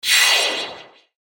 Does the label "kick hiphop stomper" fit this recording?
No